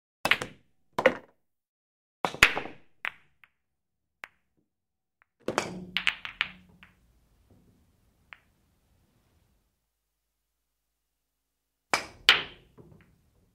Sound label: Billiard